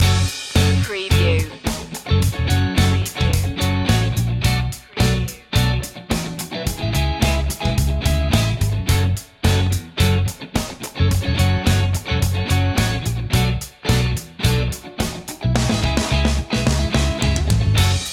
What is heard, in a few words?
disco funk clean narrative